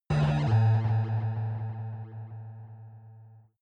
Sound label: protective shield hit absorption buzz; energie